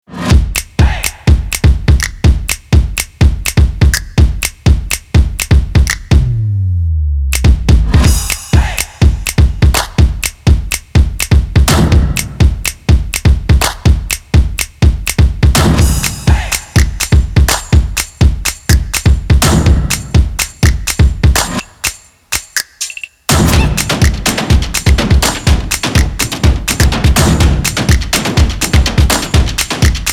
Energetic Upbeat Percussive Action Stomps and Claps